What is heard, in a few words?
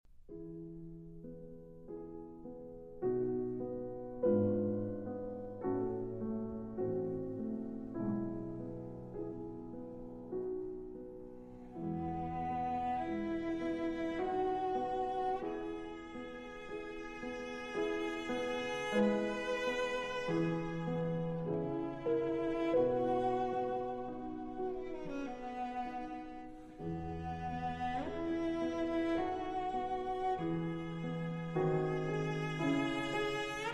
Sonata for Arpeggione in A minor Adagio